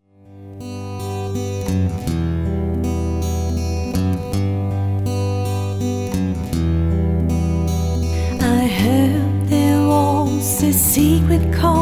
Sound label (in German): halleluja englisch